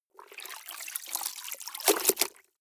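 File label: clothes water up down hard, household; haus-garten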